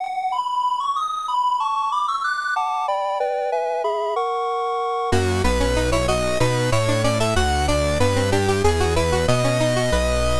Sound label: vikings in-game; c64